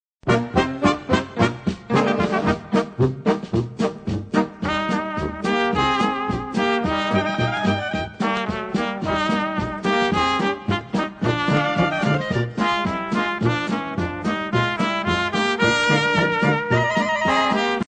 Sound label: BY THE DAM POLKA